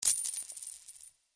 Spare Change Email; Charity

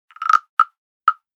Tropical Frog, notifications; Reality Bytes

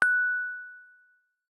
notifier ping; Mozilla; Boot2Gecko

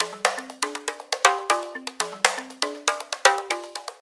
Congas, ringtones; Lenovo P700i

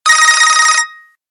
Standard, ringtones; Motorola MOTOLUXE-XT615

